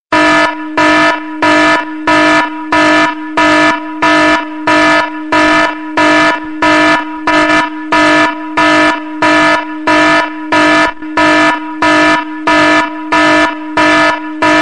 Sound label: alarm